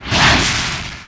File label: Pickup Misc Swish; Pickups